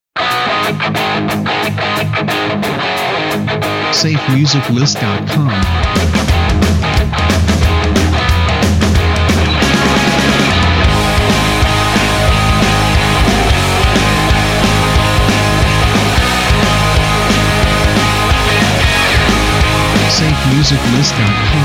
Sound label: Rock Party Video Intro